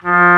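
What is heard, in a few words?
Trumpet F2; Digital Sound Factory Virtuoso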